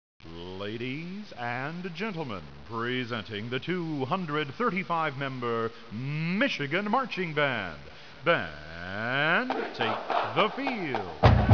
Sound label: entry cadence; ncaa; michigan